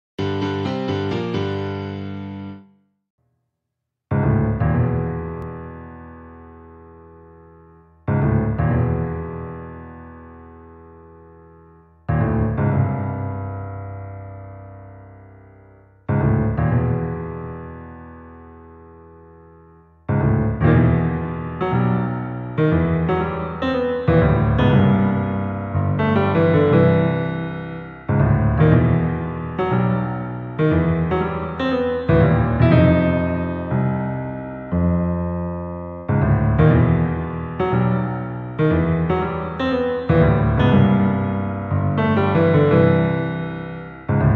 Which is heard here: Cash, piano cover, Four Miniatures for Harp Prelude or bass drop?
piano cover